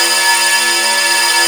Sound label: A#4 METAL; Northstar; The Wizard VOL-1